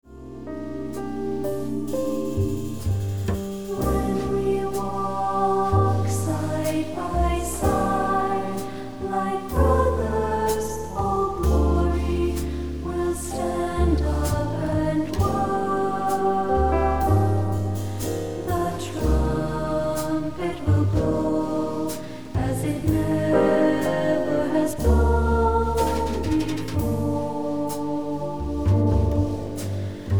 choir vocal

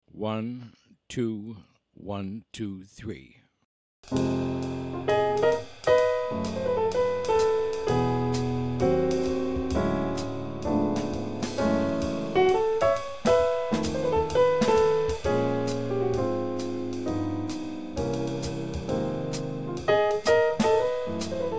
minus bass

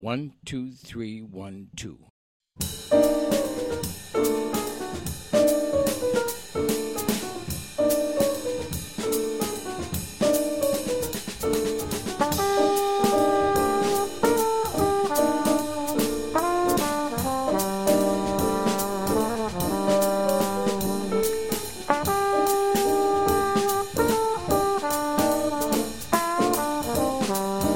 minus bass

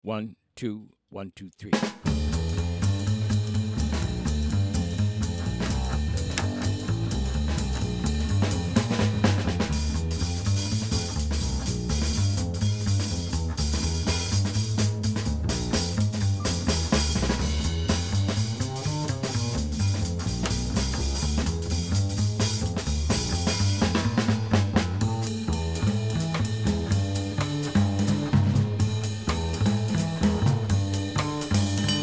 Trio bass drums only

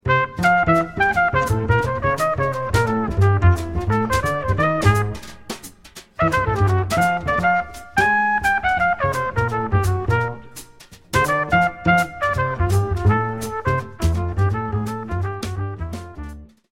minus piano

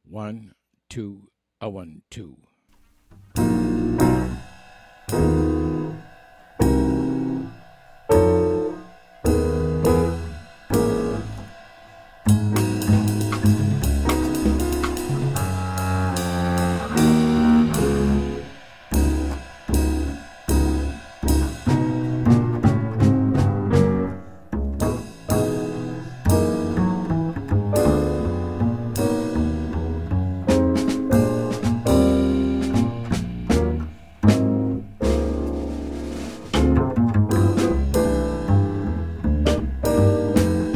minus melody; count off sets up the melody

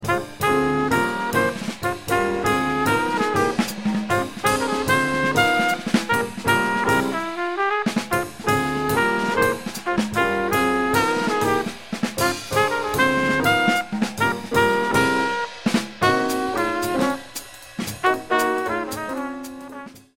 medium up minus Bass

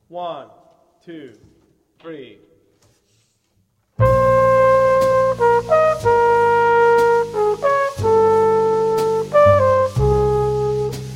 minus piano

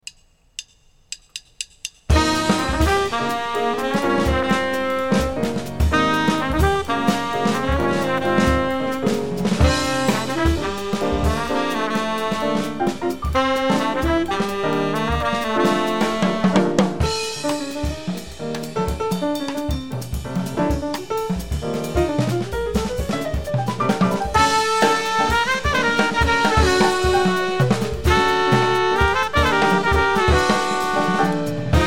minus Bass

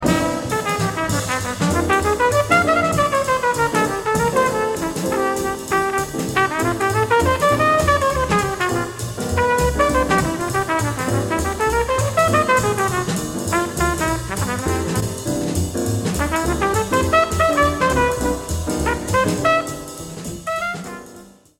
solo

